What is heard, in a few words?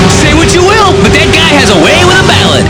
ballad